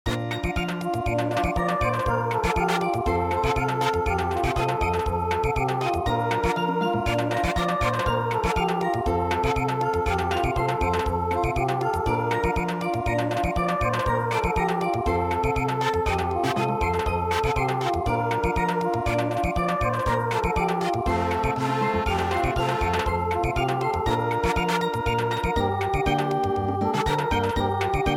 Samba; midi; traditional

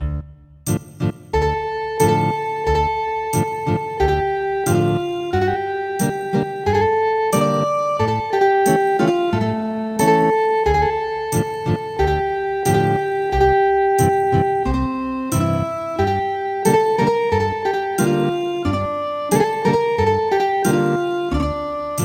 Ethnic Guitar, midi traditional

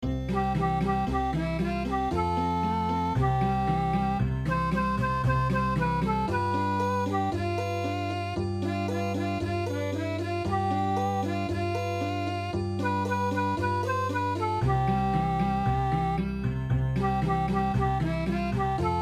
Okinawa

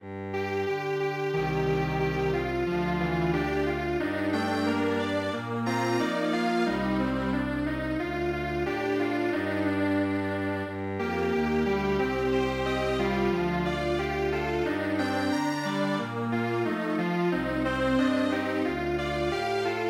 Strings Quartet, traditional

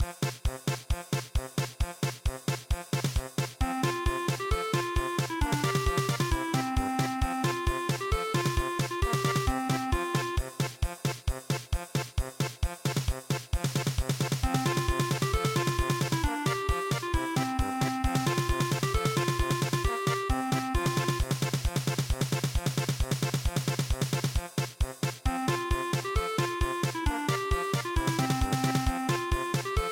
polka

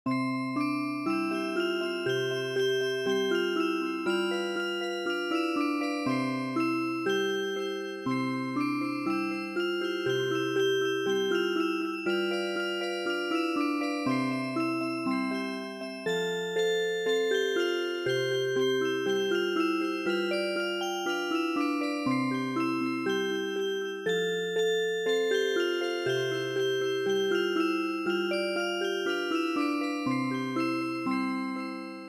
Polka Music Box; midi; traditional

